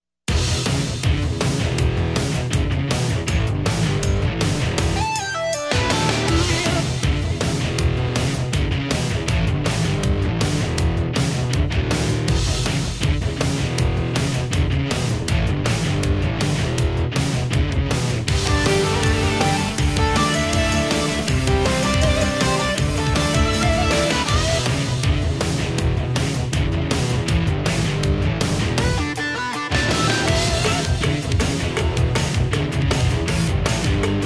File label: Accompaniment